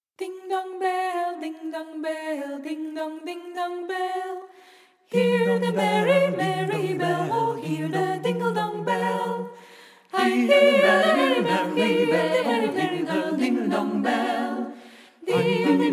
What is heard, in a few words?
ding dong bell